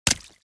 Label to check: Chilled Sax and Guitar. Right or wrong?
Wrong